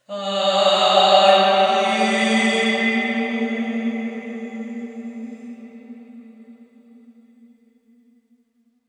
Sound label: Convolution, Santa Chiara, Italy